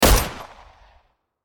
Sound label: weapon; Default T9; Tehchy-9